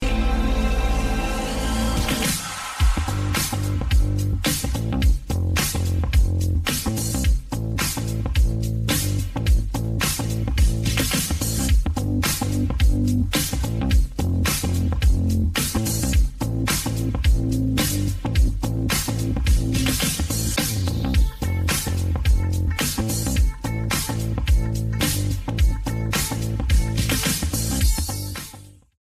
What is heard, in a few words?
instrumental, ringtone